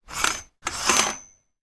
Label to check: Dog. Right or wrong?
Wrong